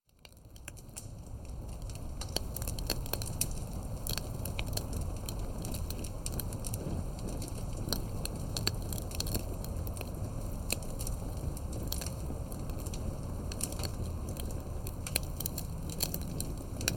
warm camp fire

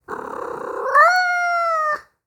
Meow Cat

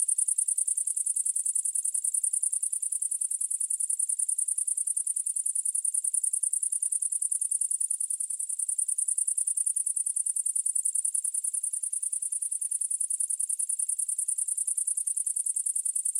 Insect Song

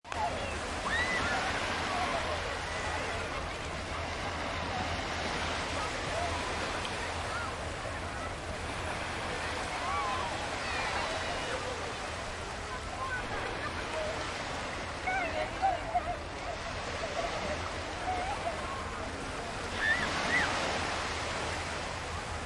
beach with playing children

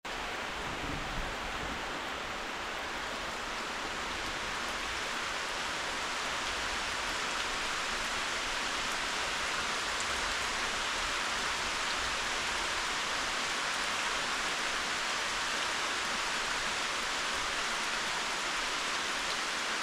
night heavy rain in forest; Forest Night Ambience

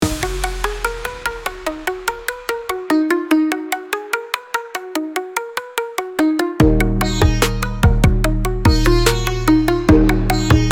hi hats

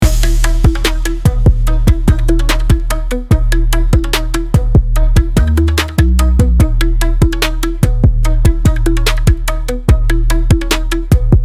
turntable strains, Productivity Beats

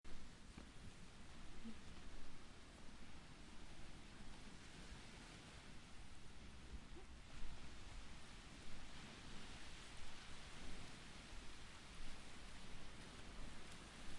sea waves

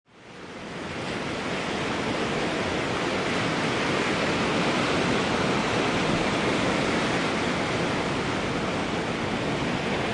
seashore far away; Sea & Waves

